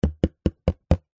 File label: cardboard box small knocking on side; foley